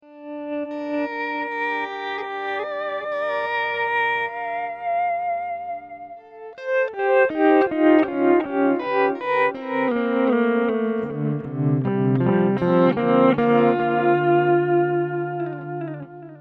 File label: Memory echo swell